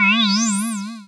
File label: confuse, status; Oryx